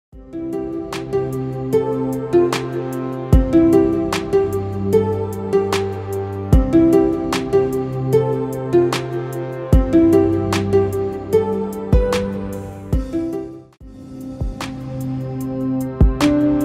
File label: Peaceful Alarm Ringtone; iPhone and Android